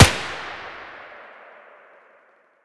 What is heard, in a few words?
mdr muzzle mono distant, weapons